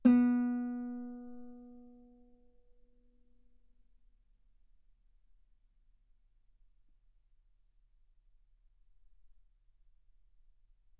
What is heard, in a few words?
Guitar ff B3; sul B